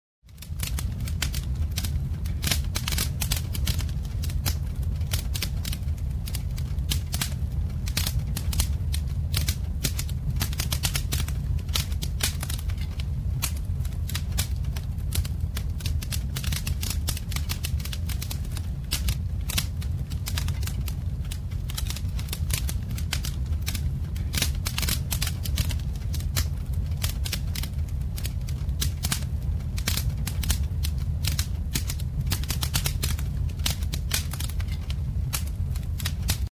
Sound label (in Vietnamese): dot lua trai